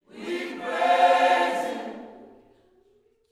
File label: WE PRAISE, Gospel